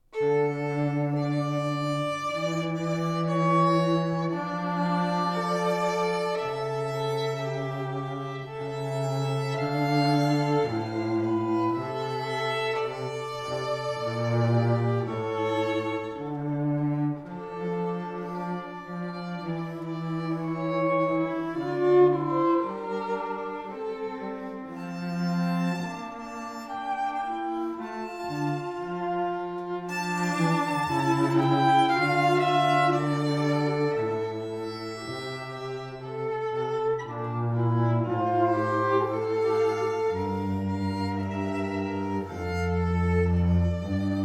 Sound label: trio; pezel